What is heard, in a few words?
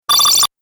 Game Console Arcade Power Up, Video Game Sound Effects